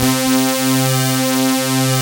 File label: BIG STAGE, synths; Pro-Rec - Synthsonic